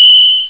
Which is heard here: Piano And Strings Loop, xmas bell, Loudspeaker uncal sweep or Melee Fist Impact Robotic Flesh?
xmas bell